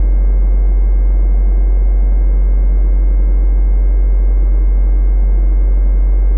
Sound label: Drone Static; Drums & Drones